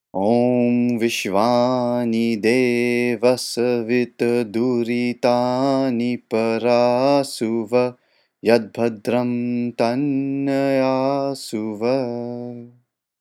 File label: om vishvanideva sanskrit mantra